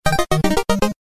duck hunt; games

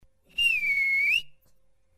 wiko whistle

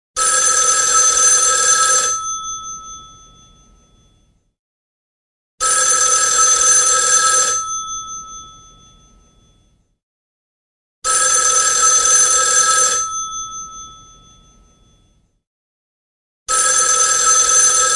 Old Style

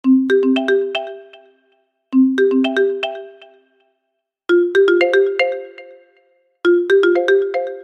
Marimba Rhythm

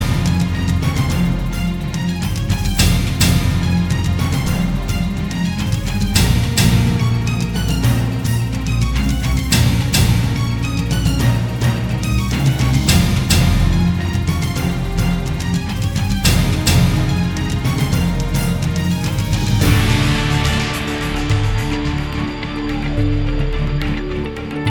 high strung

